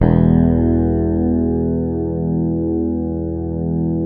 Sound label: Fingered bass L; Sampleheads; Will Lee Bass Library